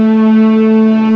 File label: LOW STR, LOSTRING; M8; CMI Factory